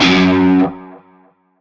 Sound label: Shawzin Oct One F Sharp; Music; Warframe; Narmer Shawzin